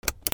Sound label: CARS